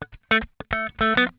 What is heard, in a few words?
PICK IT, Funk Guitar; Zero G - Funk Guitar